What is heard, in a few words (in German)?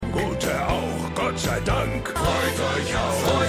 Gesang; Schmigadoon; Octavtus Kratt